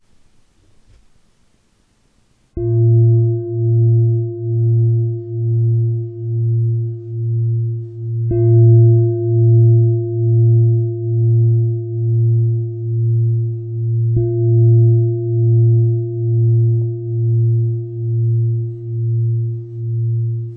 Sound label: washed big bowl